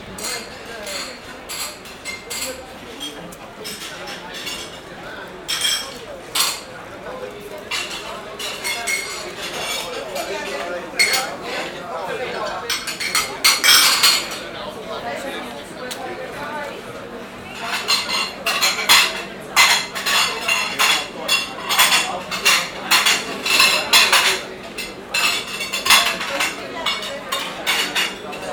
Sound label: Coffee Shop